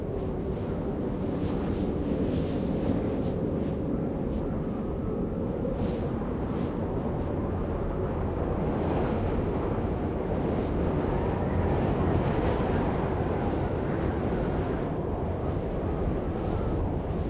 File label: ON DECK WINDS LOOP